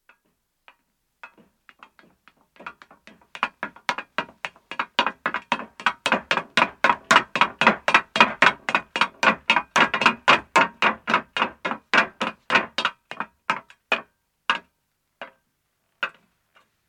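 Footstep metal descending, 1 man approaches and stops; BBC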